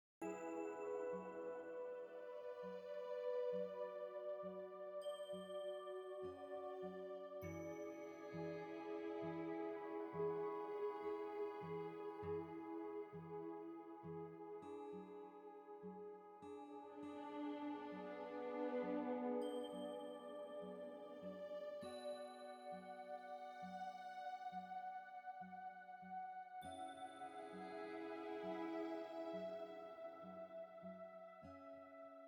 Music Loading; Biome=Mountain

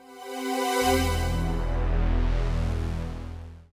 Stinger; Ben 10 Protector of Earth; Wii

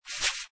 Swish Bush

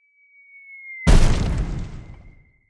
Big Leap Impact; Caffeind Founder BigLeap Impact Complex

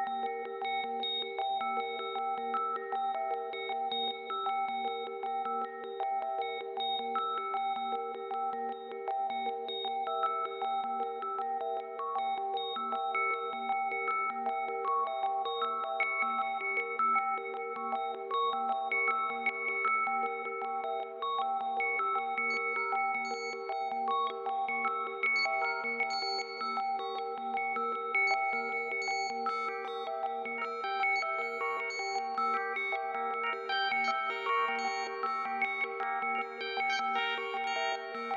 arpeggio; 0°N 0°W

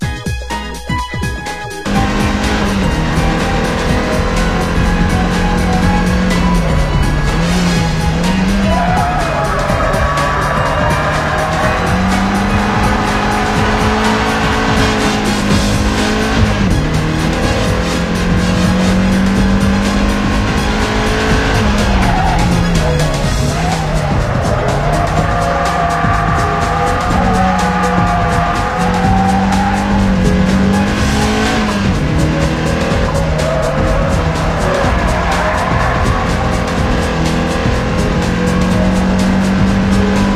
Instrumental; Shutokou Battle Gaiden Super Technic Challenge; PS1

